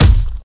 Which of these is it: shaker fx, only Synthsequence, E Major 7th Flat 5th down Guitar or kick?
kick